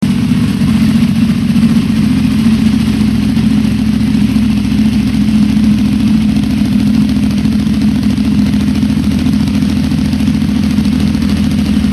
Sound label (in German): Standgas; SDR Akra